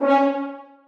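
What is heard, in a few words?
horns staccato c#4; Sonatina Symphonic Orchestra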